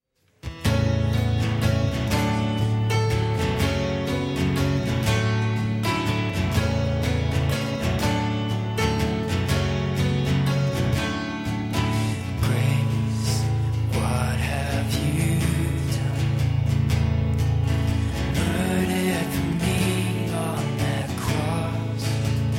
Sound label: acoustic version